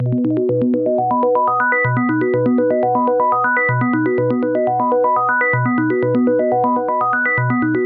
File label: 122 BPM Arps B maj G min Tonic B; arp essentials; Aura